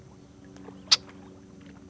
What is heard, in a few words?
rwbl, blackbir